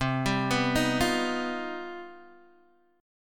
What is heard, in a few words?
C Minor Major 11th C down Guitar DADGAD